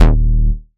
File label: Bass D#, techno synth shot; Bass One-Shots; Ghosthack; Techno Synth Shots 2025